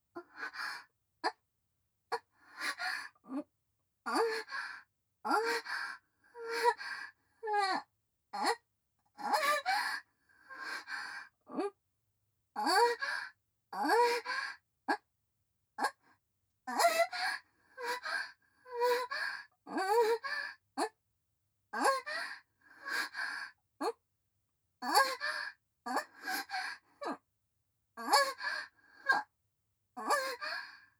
喘ぎ声 バック

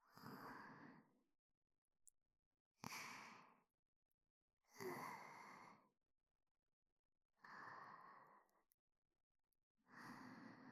左耳吐息; セフィの吐息&寝息 ループ音源

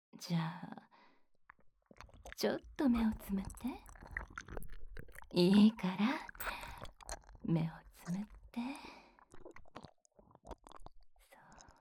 耳舐め キス 手コキ 乳首舐め フェラ される音声; 27分間耳舐めされながら、彼女のママにキス・手コキ・乳首舐め・フェラされる音声

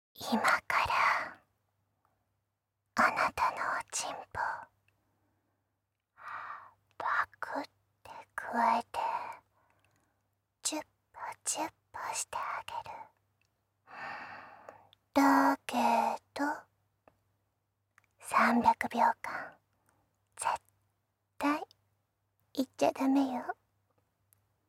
フェラ; バトル3回戦 私のフェラに耐えなさい♡

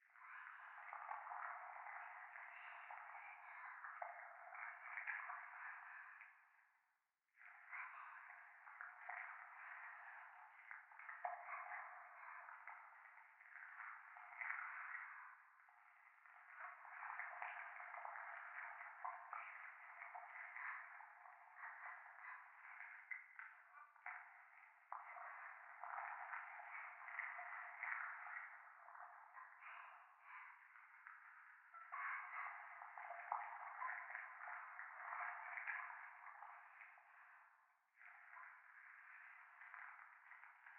教会 左耳密着処女喘ぎ 触手

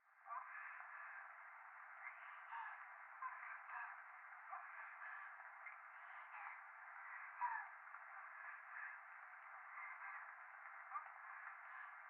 風呂 右耳密着処女喘ぎ 雨

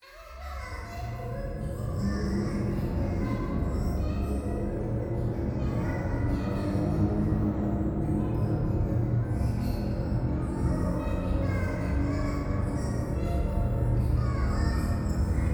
教会 可愛い喘ぎ 車の中

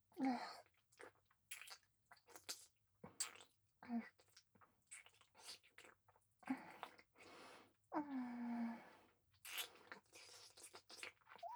乳首舐め

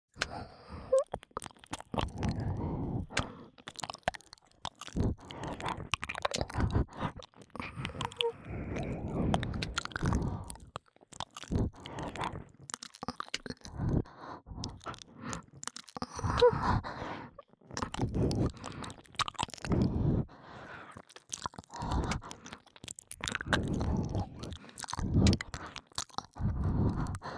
(左) お耳直舐め耳舐めエッチ, ASMR; 白耳; パンパン系効果音版

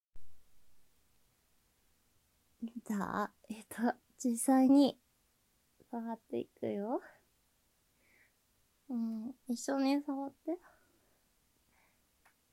実演

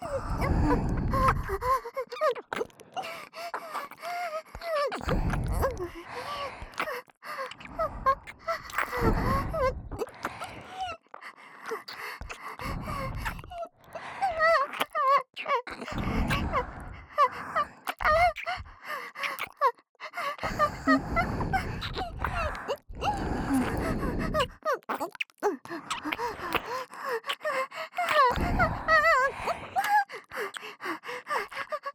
本番喘ぎ ＋ 両耳キス; おまけループ（オナサポ）; 3WAYループ; SE無

